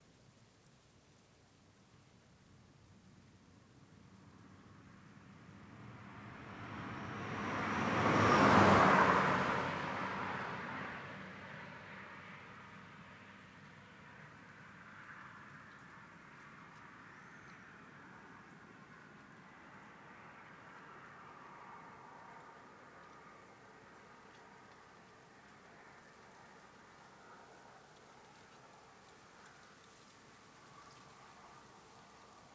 Ambiente na beira da estrada de fazenda carro passando estrada silenciosa; maquinas; colecao sonora do cerrado

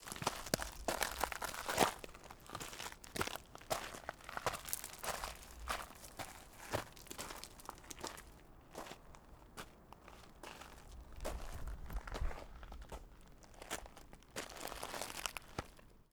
Passadas e variacoes de pes no cascalho; canais mono

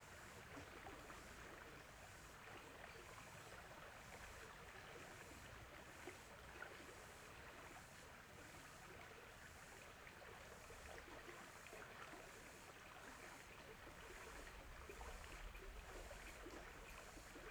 Rio perto da queda dagua cigarra canta e para

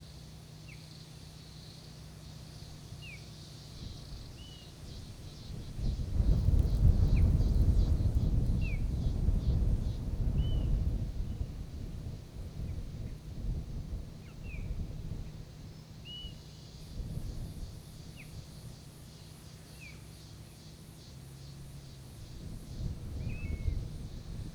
Vento com passaros, cigarras e motor longe de manha

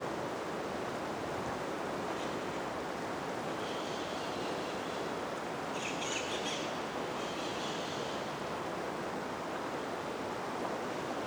Periquitas vindo do fundo da caverna terra ronca, muita reverberacao, rio; aves; canais stereo; Coleção Sonora do Cerrado

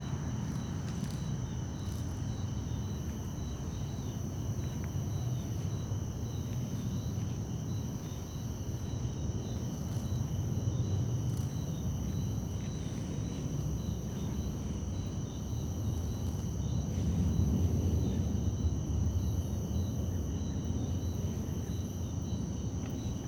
Ambiencia parque beija flor a noite com grilos, passos na grama, aviao; ambientes naturais; Coleção Sonora do Cerrado

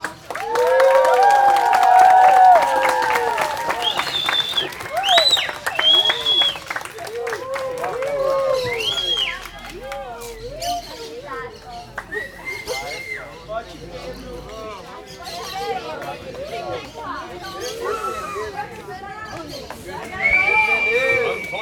Pessoas ovacionando e passaros na praca; multidao; Colecao Sonora do Cerrado

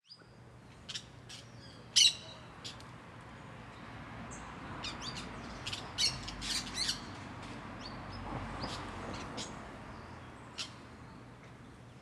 Periquitos Verdes Muito Marcados em Quadra Residencial de Brasilia; aves; Coleção Sonora do Cerrado

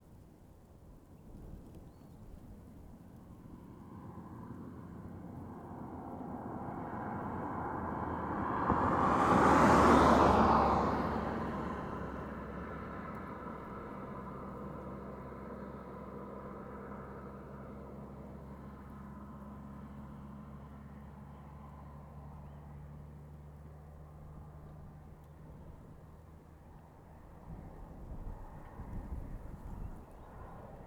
Estrada de sao jorge pouco movimento carros passam rapido com vento leve; transito

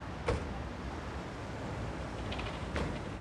Motorista e Passageiro Fechando Porta de Carro a Meia Distância; portas

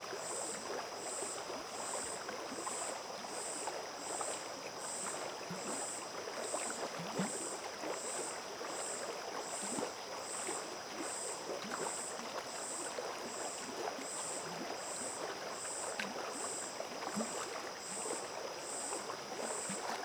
Beira do rio da lapa perto da caverna terra ronca 1, grilos, cigarras; agua

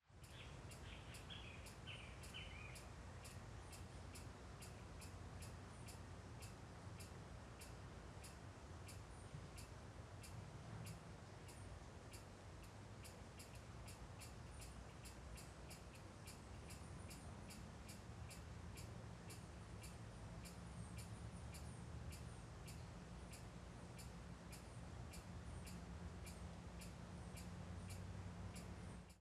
Cigarras no Parque Olhos Dagua com Transito ao Fundo; Cerrado; insetos; colecao sonora do cerrado

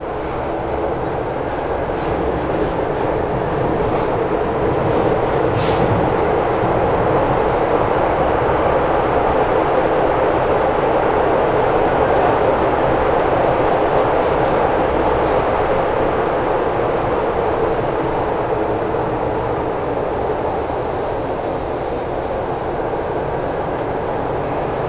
Metro de brasilia dentro do vagao subsolo reduz velocidade mas continua

